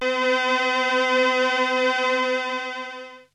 MELLO STRINGS, Siel; TECHNO-BWARE

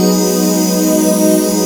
Phatt Pad, E-MU Xtreme-Lead

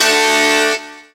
SYN Q-GUI, dance synth; Sound & Vision - Gigapack I CD 2 (Roland)

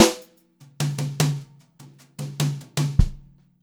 JZ FILL, Brushed Drum Loops, JAZZY; USB Soundscan vol.39; AKAI

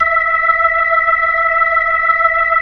B-3

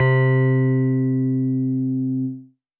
Synth Prophet One Shots, Keys C; Electronisounds

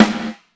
DEADTUNE V, SNARES; Sampleheads - New York City Drumworks VOL-1

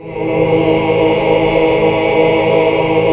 HAL CHRD2, chords; Sound & Vision - Gigapack II CD 1 (Roland); HAL Greg.Chords1

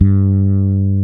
Fretless Bass

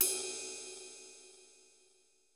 Ride Cymbal, Roland SC-88 drums

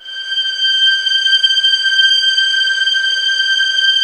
violin G6, soft violins; Q Up Arts; Denny Jaeger Master Violin Library

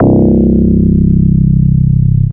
Single Electric Basses, Dance Floor Bass

